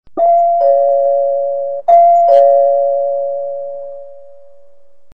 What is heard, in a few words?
door bell ringtone